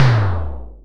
Snare Low Simmons2, Modular; Wiard 300 Series

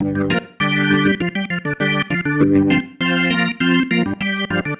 Pattern, Organ

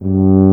tuba F; Miroslav Vitous; Solo Instruments VOL-2

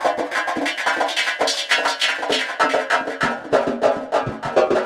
Echo Chuff Down Up, Synthetic Pulses

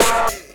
Weird Repeater

